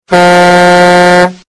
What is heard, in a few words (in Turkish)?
korna düdük; korna siren düdük alarm zil sesleri